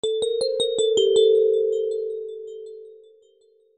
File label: Hickory Dickory Dock Notification